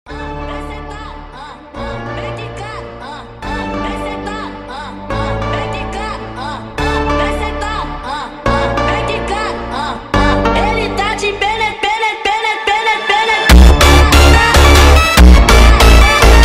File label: funk sped up